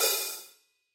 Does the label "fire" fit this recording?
No